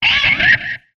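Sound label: Music Guitar Pick Scrape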